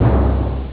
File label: gong; ST-XX Amiga Soundtracker Sample Packs